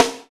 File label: SNR, Snares; AMG; Now CD-ROM (Roland); NOW! Drums